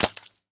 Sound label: flop, pufferfish; minecraft